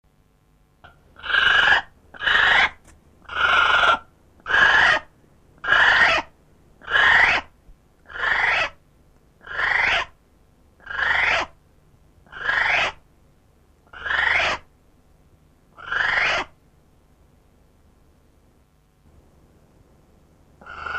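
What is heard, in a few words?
female north island brown kiwi; conservation, native animals, birds, kiwi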